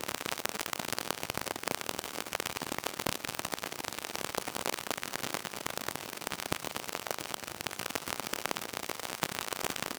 genera un ruido granuloso, analog

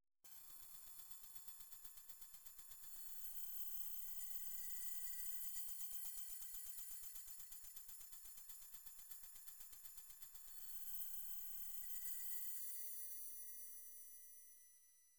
brillitos tenues, sparkle

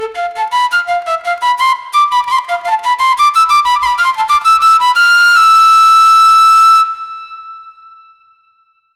flauta dulce sola; wind instrument

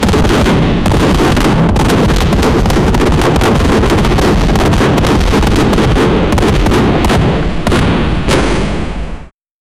cohetes dispados y una pe, fireworks

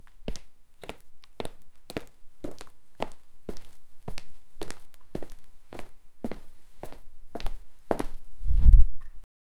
sonidos de pasos de botas

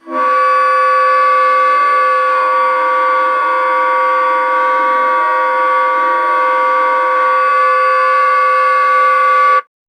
sonidos de saxofn, saxophone

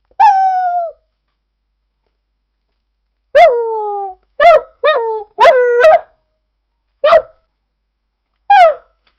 sonido de perro cute; pet

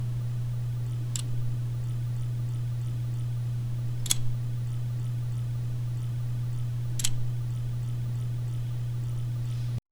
engranes de reloj de mano, handheld

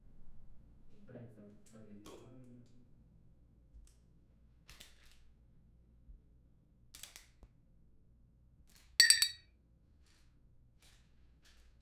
cortina de terciopelo

